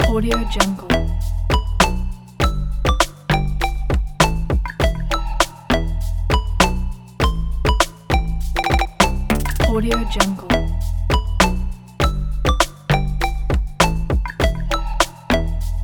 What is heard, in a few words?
electronic game menu loop